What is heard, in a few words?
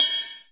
basic ride bell